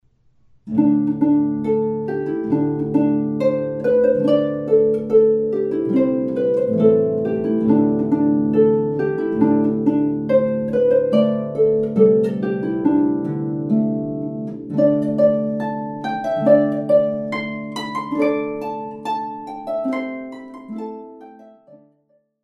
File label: chanter fade out